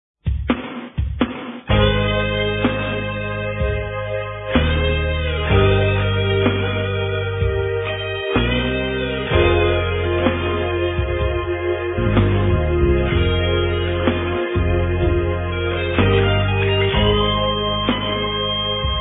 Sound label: piano